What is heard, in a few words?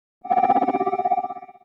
HOUSE FX 125